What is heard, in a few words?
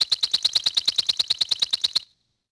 bird; Tony Hawk's Project 8; XBOX